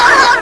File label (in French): gorge; MOBICLIC; langues